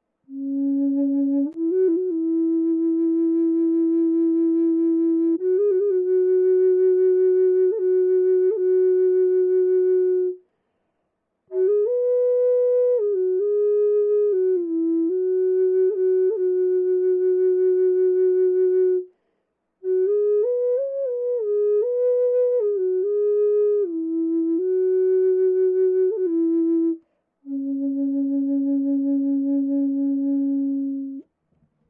Bass C Sharp Eastern Cedar, flutes

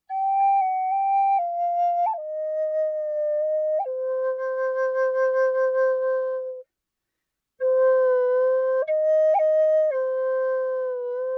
Lacewood Key C, flutes medium

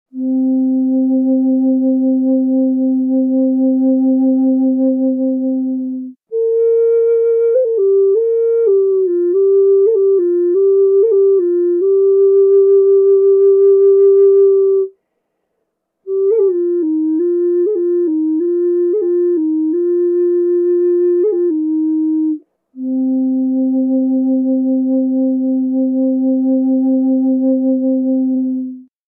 bass C maple

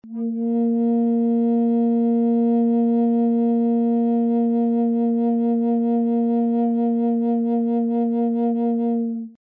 Flutes Single Note, Bass B Flat

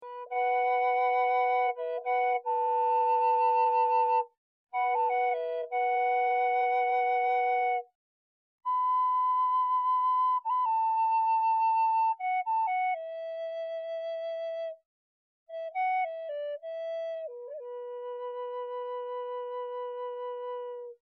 Drone Medium B, Native American Drone Flutes; Scales; Full Spectrum Scale

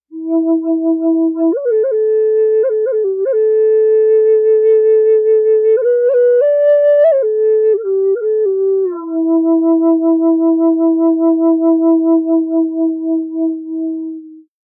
Large E Eastern Cedar, flutes

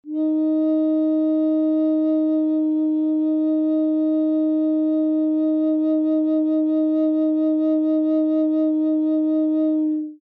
Flutes Single Note, Bass Eb

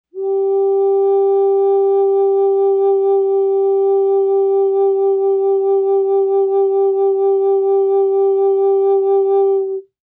Large G, Flutes Single Note

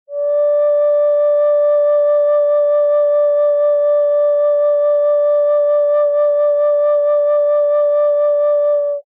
Small D, Flutes Single Note

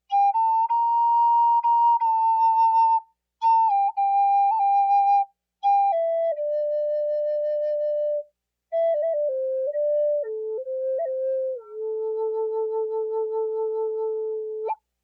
Eastern Cedar Medium A, flutes

